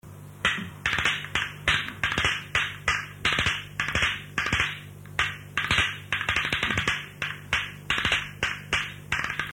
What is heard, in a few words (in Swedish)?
Snatter; Snatterpinnar; ringsignaler; skralbergs